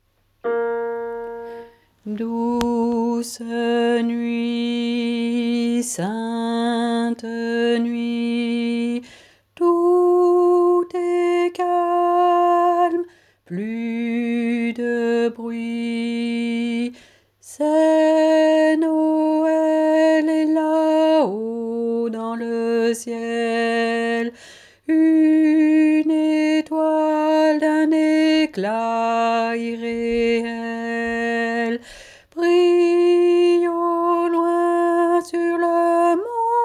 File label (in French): Douce nuit Basses